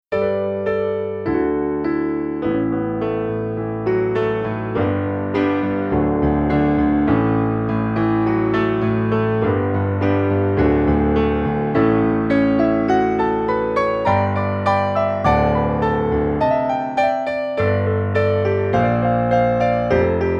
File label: Standard Grand, Piano